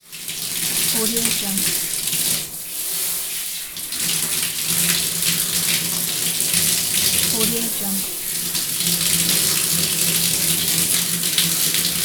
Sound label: showering in a shower cabin